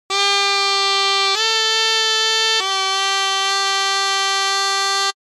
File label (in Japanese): 豆腐屋さん